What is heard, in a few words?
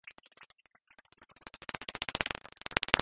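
Myotis sp broadband heterodyne; bat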